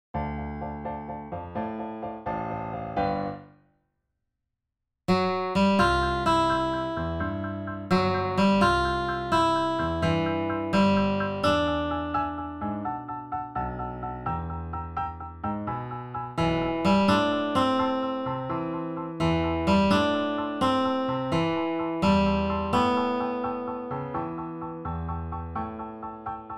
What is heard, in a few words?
accomp melody